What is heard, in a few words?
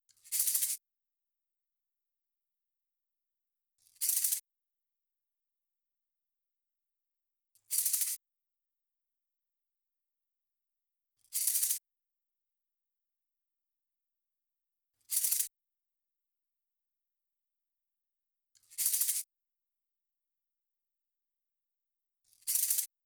Shaker